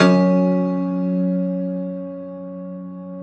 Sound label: Rubbery Piano, prepared piano; Total Piano